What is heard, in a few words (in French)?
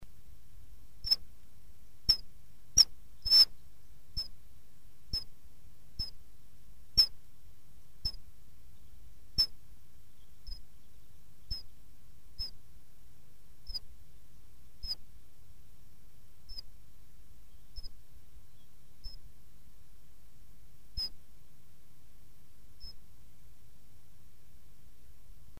Martin Pecheur, Oiseaux; Sons Oiseaux